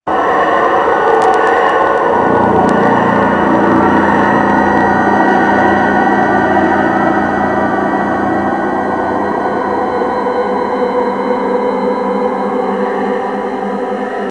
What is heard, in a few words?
horror ringtone